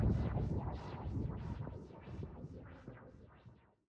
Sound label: noise